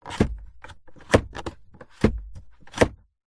vehicles car fan vent slider move open close engine off; zapsplat